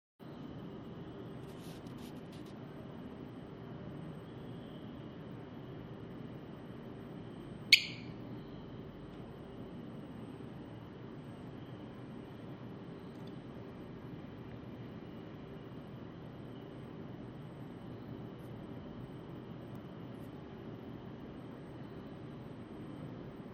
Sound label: Fault signal, rauchmelder